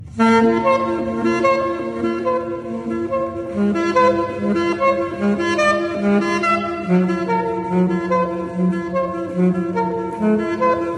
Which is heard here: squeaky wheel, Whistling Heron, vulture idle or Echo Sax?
Echo Sax